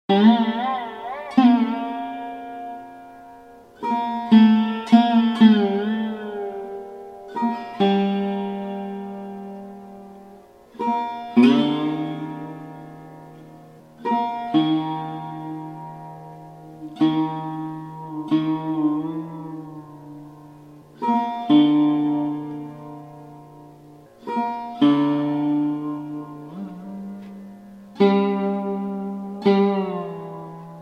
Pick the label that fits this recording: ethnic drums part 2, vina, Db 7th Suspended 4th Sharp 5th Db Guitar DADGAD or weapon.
vina